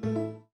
Windows Hardware Insert; Glass

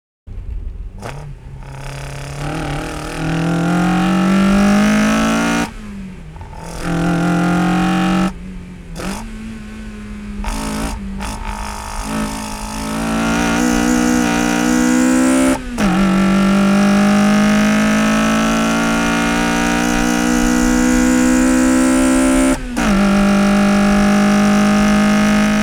Boost Sound

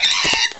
harpy hurt; Indev+ 0.3 Portable

